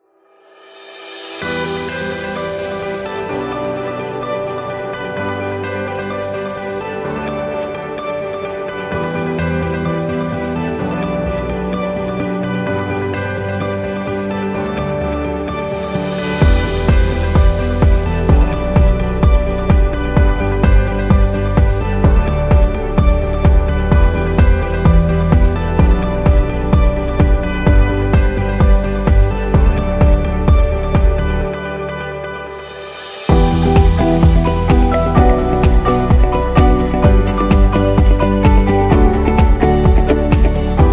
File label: Corporate